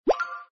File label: pop From Storage